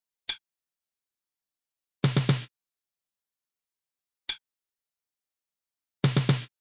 Toque - Repique; fanfarra

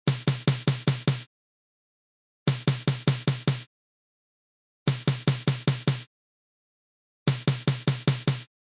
Toque - Repique; fanfarra

